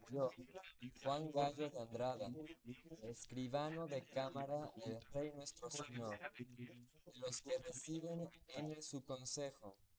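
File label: male, instantaneous mixture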